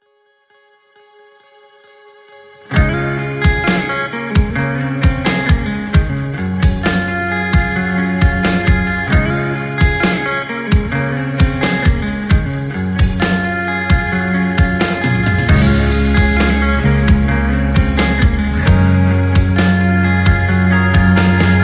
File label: No Synths